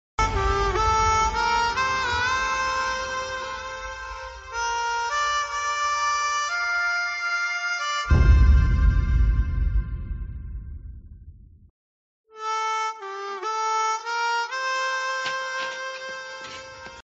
instrumental music ringtone; sanju movie

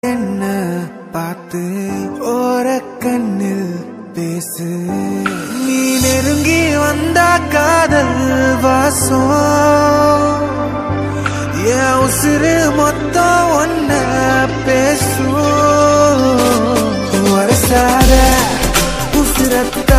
Tamil love ringtone

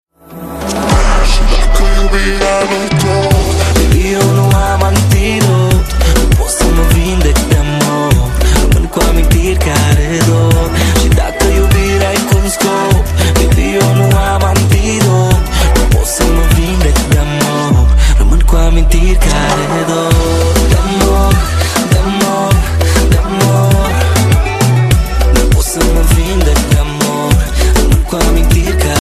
romanian ringtone

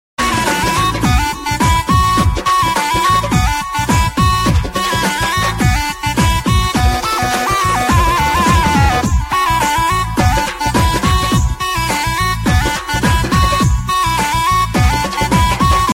huawei p20 pro ringtone

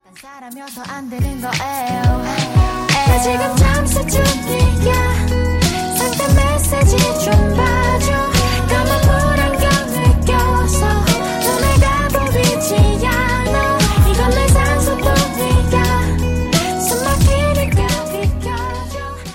underwater ringtone; apple

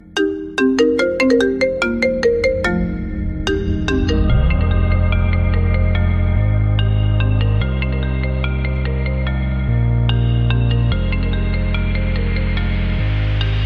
apple iphone 8 original ringtone

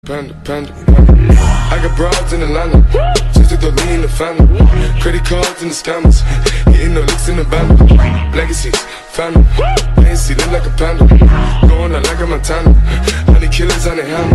apple panda mix ringtone

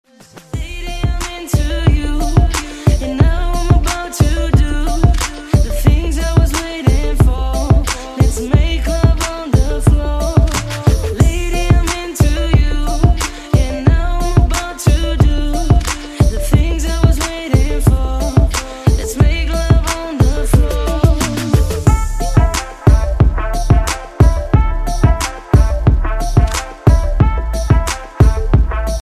latest romanian ringtone

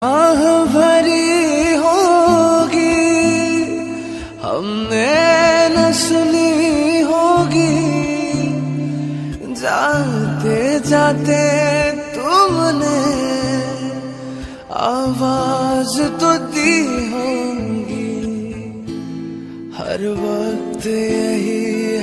sad ringtone

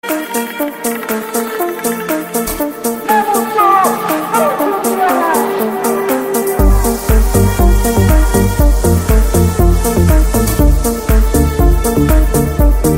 arabic hit ringtone

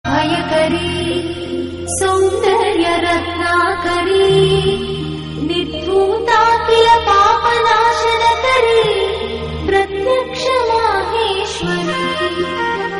ringtone; sri annapurna

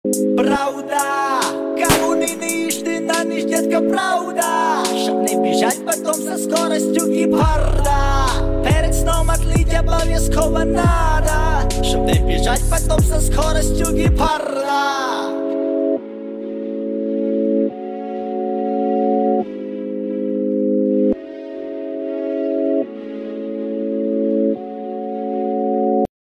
russian ringtone

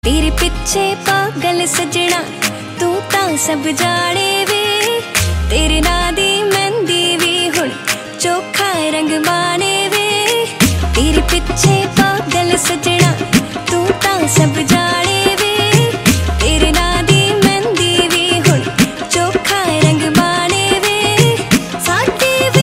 punjabi ringtone

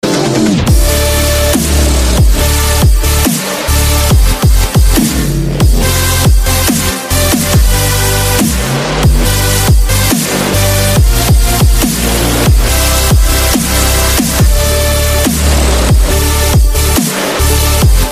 dubstep ringtone

